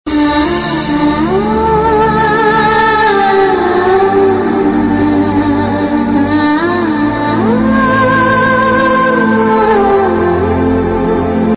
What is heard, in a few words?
Female; Magadheera